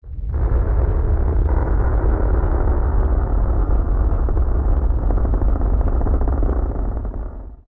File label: Deku Tree Die; OOT; The Great Deku Tree